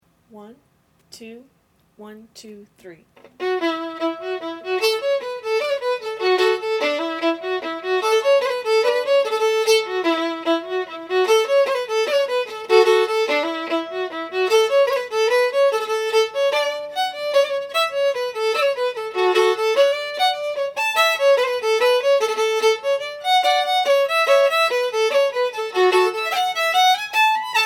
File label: fiddle At tempo; Miss Wedderburn